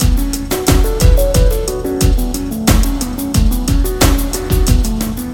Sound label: Jazzy Hip Hop